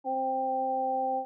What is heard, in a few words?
trumpet